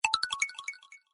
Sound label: Poppy Sms; Samsung